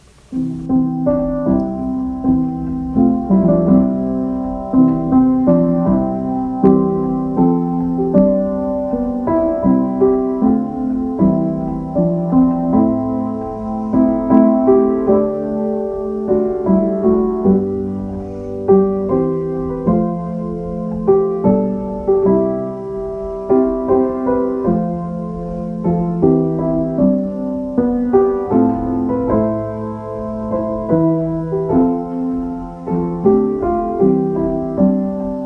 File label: piano